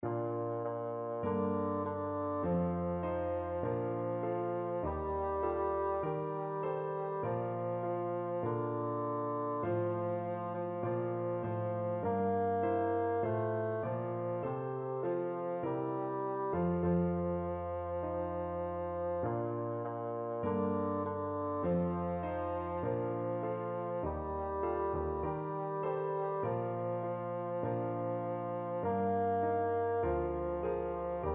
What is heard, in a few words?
holy holy holy, bassoon